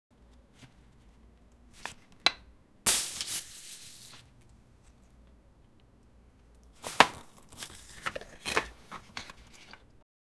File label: meat put on plate; dining